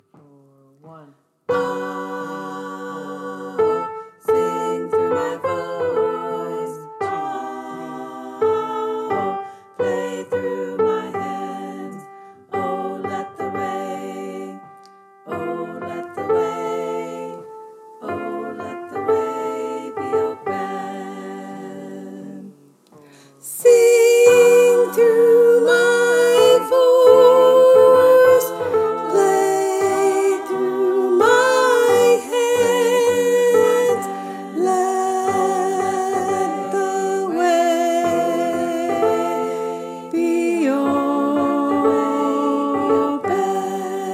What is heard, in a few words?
high descant, choir; The Freedom Choir